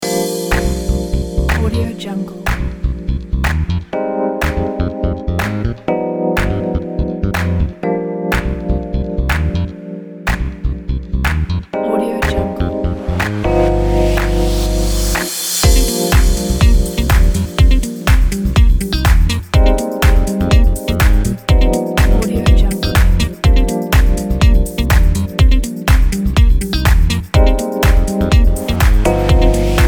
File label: lounge music